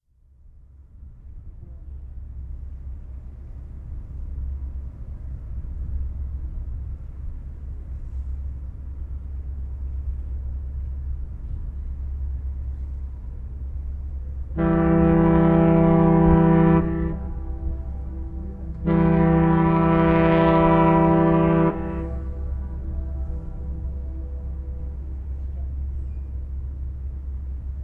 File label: harbour; ohato